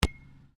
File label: Football hit post, Soccer Ball: Hit The Post, Metal; Sports; Stuart Duffield